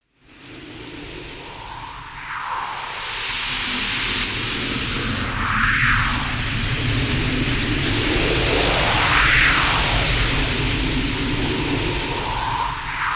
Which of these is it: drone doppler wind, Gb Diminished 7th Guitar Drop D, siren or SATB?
drone doppler wind